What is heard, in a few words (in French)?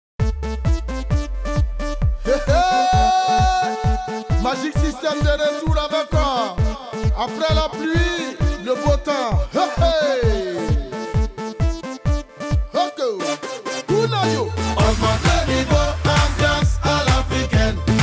Ambiance à l'africaine